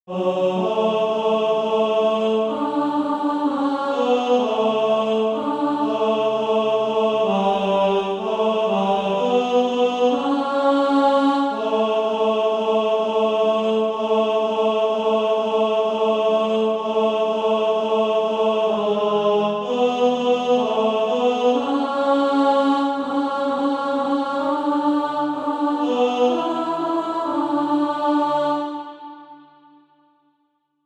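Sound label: tenor, SATB Practise Music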